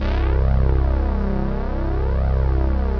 Bass

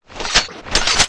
beamgun charge shoot end idle, weapons

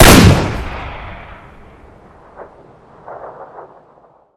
m4 unsilenced, weapons; lgk glacier

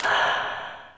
zombie ghost footstep; ghostfury

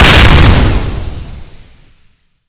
beam gun exp

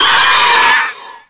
alien pressure, zombie

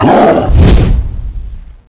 attack

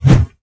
Double Throw cast; d2lod

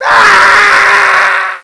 male infection

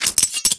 backstab, weapon; girlgamers

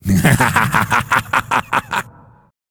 laugh

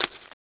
zombie ghost footstep; ghostfury

